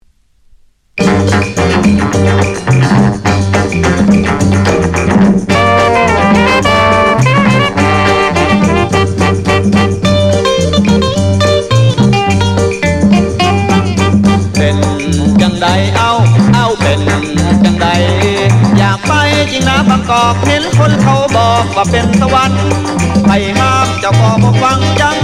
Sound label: luk thung jazz molam, thai